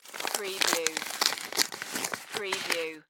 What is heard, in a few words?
Envelope Tear Open; Sound Effects Bible